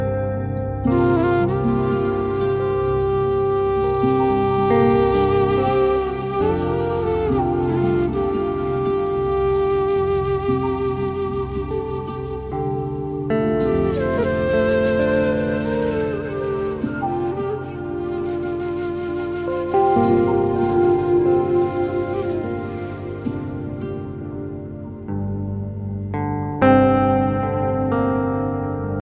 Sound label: new hindi music ringtone, bollywood ringtones